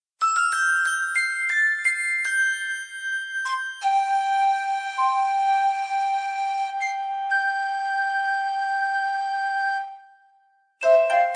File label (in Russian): narodnaya melodiya; zvuki k osennim prazdnikam; zvukovoye soprovozhdeniye